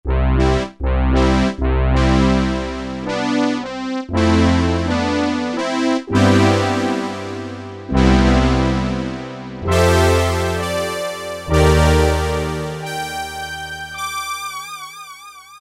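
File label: lead Brass; E-mu Orbit V2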